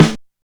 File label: low acoustic snare sound D# key, Royality free snare sound tuned to the D# note.; snare drum samples